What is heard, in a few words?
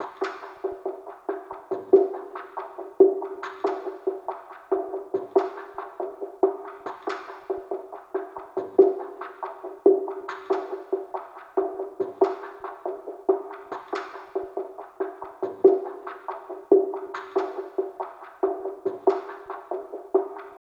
Percussion